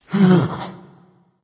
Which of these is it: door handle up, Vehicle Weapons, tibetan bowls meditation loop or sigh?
sigh